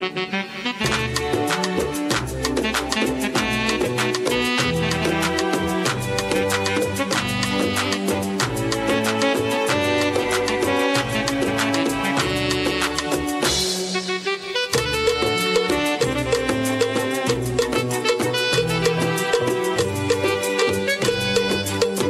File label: nice egyption music; Jazz